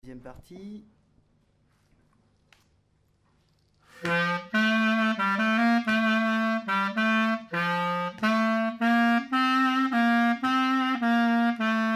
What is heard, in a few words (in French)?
grec lent, clarinette; stg clarinette